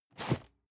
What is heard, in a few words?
cardboard box medium slide short hard; objekte